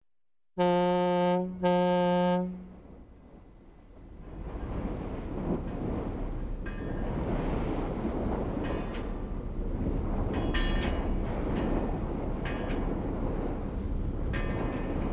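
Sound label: port general atmosphere ship horns strong wind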